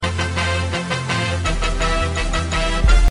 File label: trumpet notification